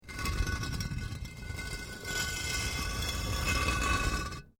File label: Brick Medium Hollow Move